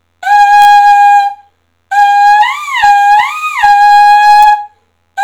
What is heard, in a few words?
pugilis, horn